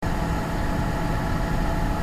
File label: fan